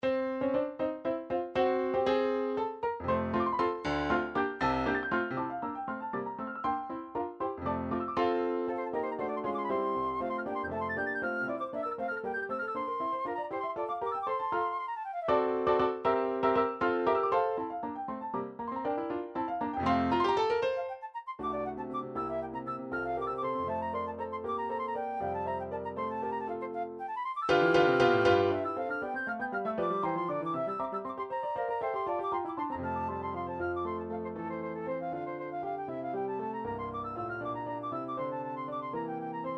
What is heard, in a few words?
andalucia for flute and piano movement named vivo